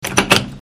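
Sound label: key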